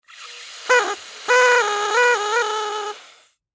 Party Favor Noise, Party Favor Noise Maker Blow Drunk Broken Airy; Foley; Blastwave